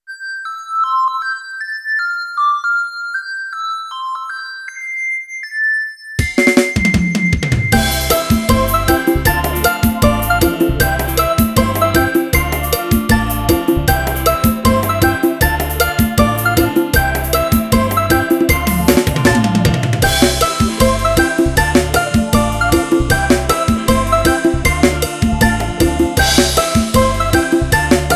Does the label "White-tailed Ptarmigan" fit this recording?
No